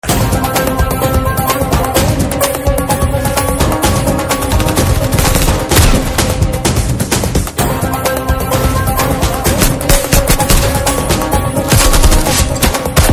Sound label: Trailer Theme Ringtone, Telugu; Akkineni Nagarjuna; Wild Dog